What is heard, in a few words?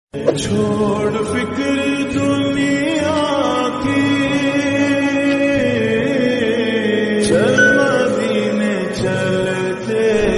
Slowed Naat Islamic